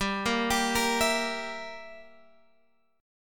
G Minor 6th, Guitar Open G

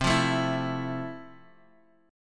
F Major 7th, C

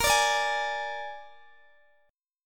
A sharp 7th A sharp, Guitar DADGAD

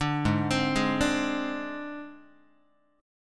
G sharp Major Flat 5th, Guitar

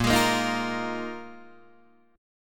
A sharp 7th Suspended 4th down Guitar DADGAD

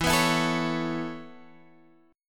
E Suspended 6th E

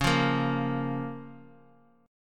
Db 6th

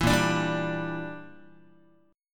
A sharp 6th Flat 5th, Guitar; A#6b5 strummed; JGuitar

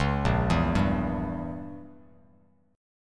A sharp Diminished 7th, Bass Standard

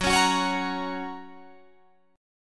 F sharp Major, Guitar, Drop D

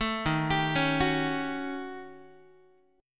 Eb 7th Sharp 9th Flat 5th, Eb, Guitar; Eb7#9b5 arpeggiated; JGuitar

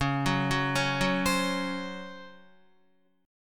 C sharp Major 7th Suspended 4th C sharp Guitar; C#M7sus4 arpeggiated; JGuitar

